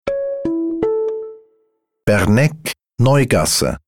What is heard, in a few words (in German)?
jingle neu; berneck